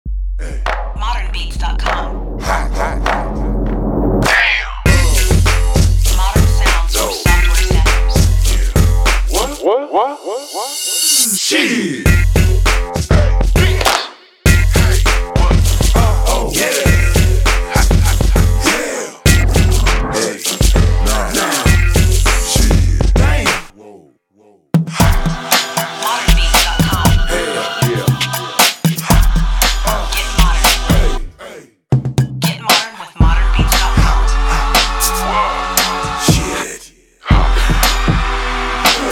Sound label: Vox Chantz